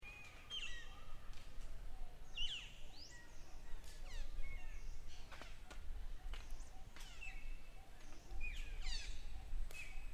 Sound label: Jungle